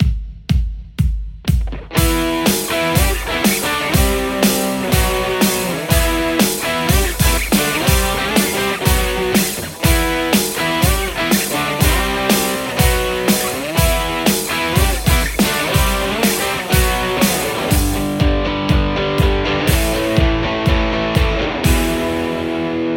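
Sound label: Stratocaster; aradaz green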